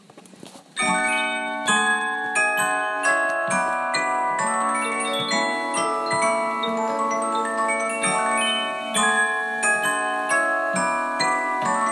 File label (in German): hochzeitsmarsch polyphon; drehorgeltag 2018